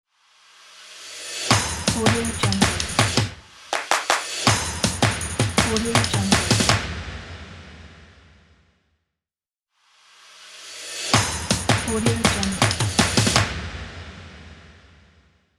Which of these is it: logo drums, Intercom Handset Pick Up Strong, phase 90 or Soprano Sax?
logo drums